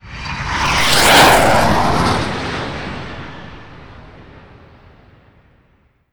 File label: Vehicles Jet Flyby